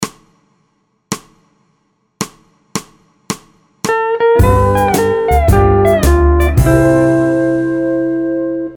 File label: Key C Vamp 4 4